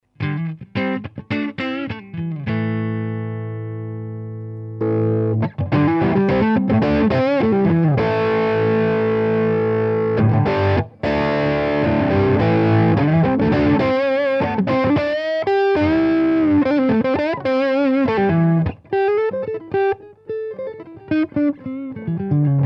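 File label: Micro Tube OD Mixdown, guitar amp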